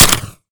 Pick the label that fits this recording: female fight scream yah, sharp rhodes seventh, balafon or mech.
mech